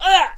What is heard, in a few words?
Musketeer Death Vocals